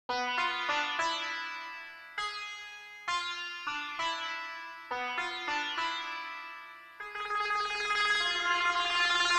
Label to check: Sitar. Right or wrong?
Right